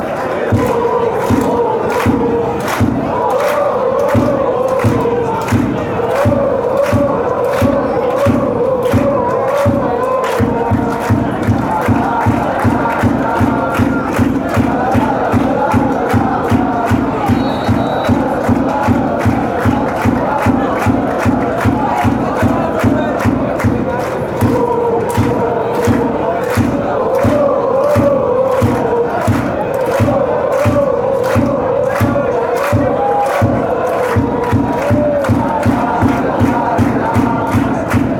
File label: oh oh oh lalalala, chants